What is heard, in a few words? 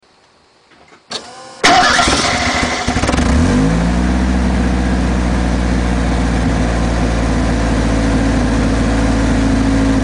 Broke Exhaust; XR